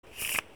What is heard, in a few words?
Send Card, 麻将